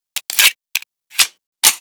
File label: Reload, Guns; FPS Kit Version 2.0